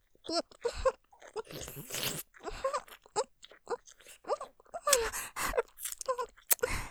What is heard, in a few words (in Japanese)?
フェラ音マシマシ さらにぐっちょり吸い上げ系; おかずにスパイス２; 勢いマシマシ; モノラル